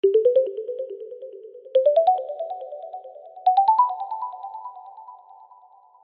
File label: nice cool cute message alert tone